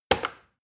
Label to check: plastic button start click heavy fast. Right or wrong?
Right